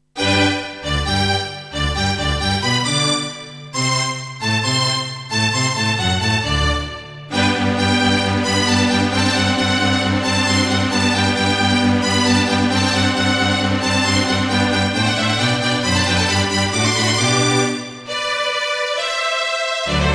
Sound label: Anteg EasyMIDI EM-100; classical; Mozart; Eine Kleine Nachtmusik partial